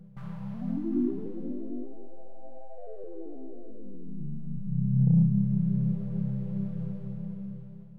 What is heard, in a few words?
Trance